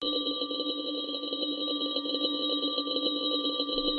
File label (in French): Particule Nappe; Puddle; puddle plus ios gamerip 2018